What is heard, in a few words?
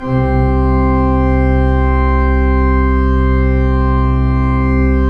PIPE, 3DOrgans